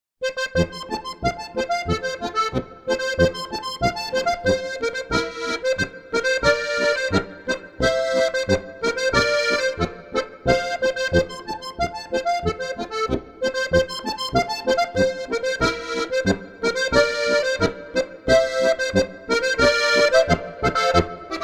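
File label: stilluppa simal boarischer, Steirische Harmonika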